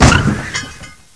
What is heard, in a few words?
CRASH